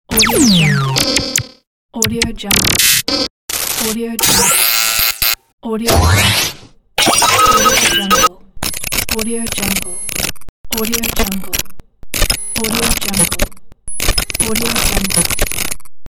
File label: glitch fx pack